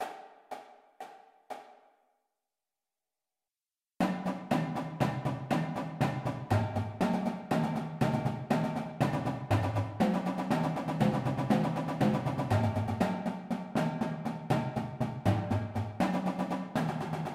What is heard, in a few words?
Paradiddles; Beginner Paradiddles; Drumline Warmups